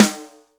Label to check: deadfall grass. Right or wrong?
Wrong